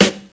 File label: snappy snare sound g key; snare drum samples